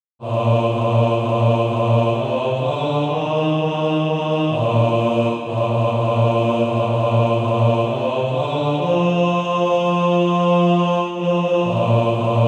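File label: bass, Practise Music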